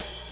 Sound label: Closed Hats